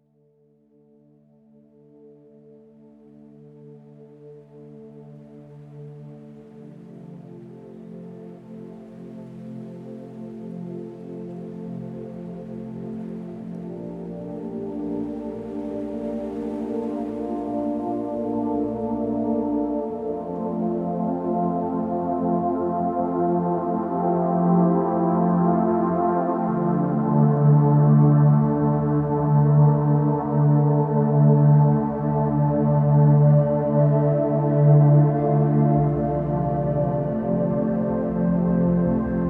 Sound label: Relaxing Spa Music Sounds of Nature White Noise